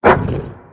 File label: bark; smite; scylla